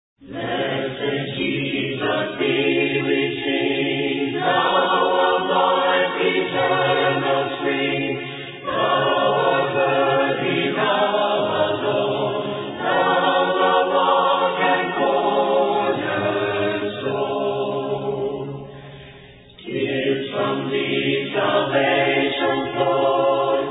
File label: a capella hymn; blessed jesus thee we sing